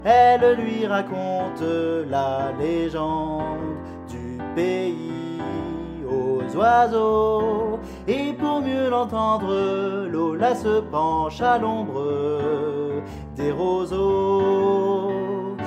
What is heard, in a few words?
Soprano